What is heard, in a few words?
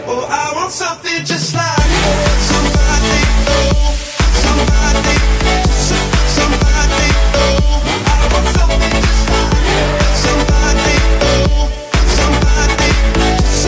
Drill Team Tryout Music; Legacy HS; Kick